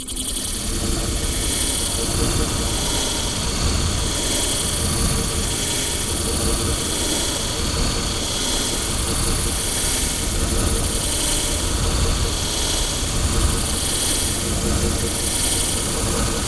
PANWAVES; EARTH

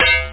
Sword; conquest

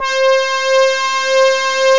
brass well

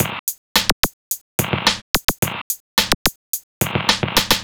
rock

